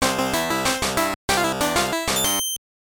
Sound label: Jingle; Onigashima Pachinko Ten; Game Boy